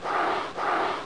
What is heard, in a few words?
reeds